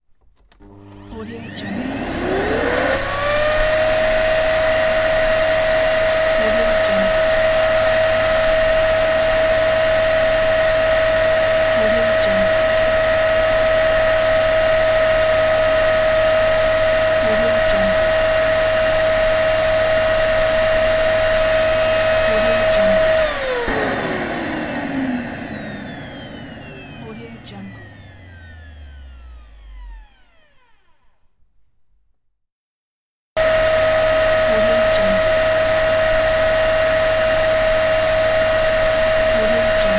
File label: vacuum cleaner